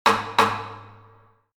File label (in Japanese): taiko